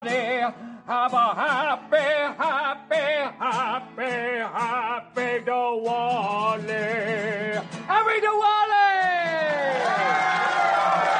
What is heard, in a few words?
happy diwali ringtone